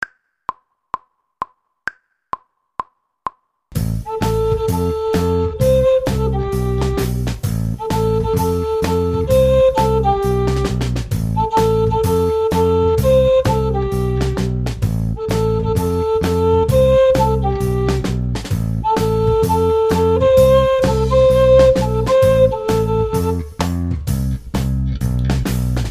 treble recorder

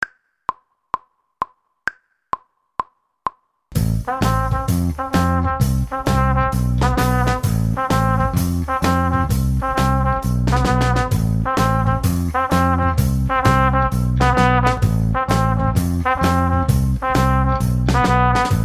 trumpet section

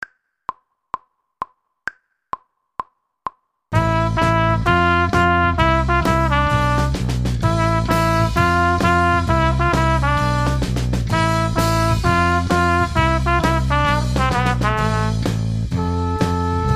trumpet

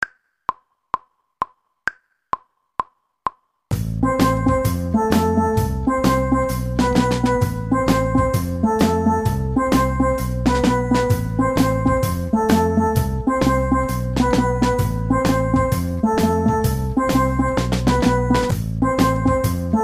steel pans; steel pans 1

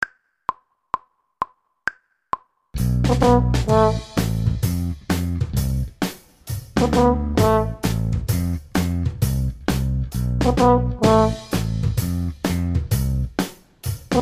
horn

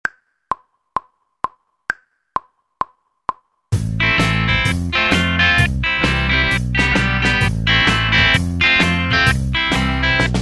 guitar

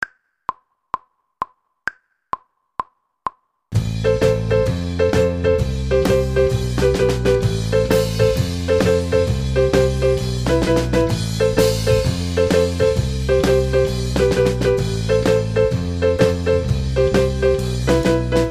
keyboard section

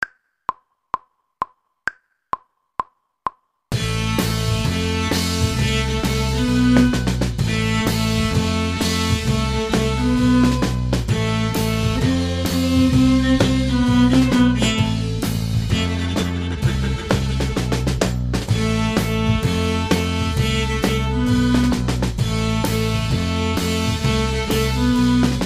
cello section

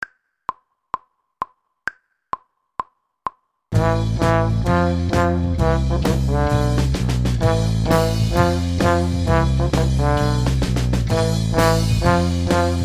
baritone

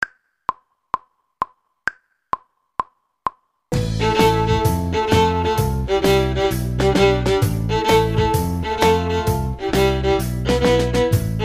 viola

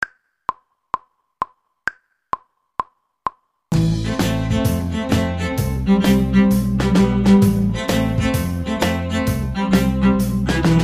cello section

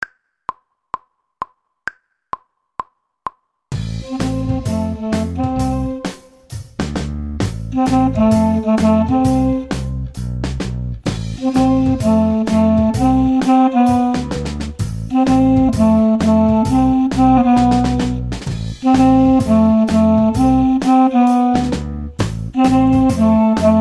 clarinet

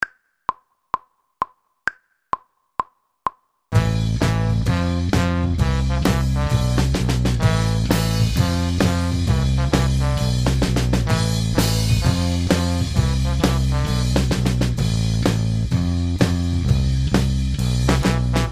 trombone

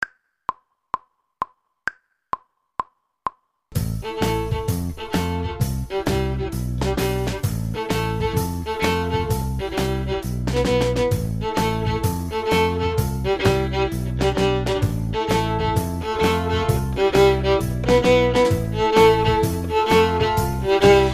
viola

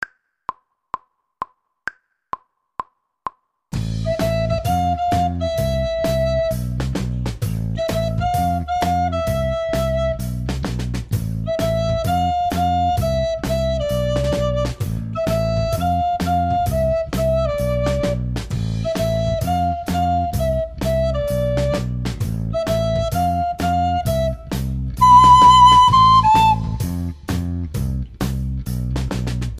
descant recorder

